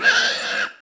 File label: boar sight; Giant Boar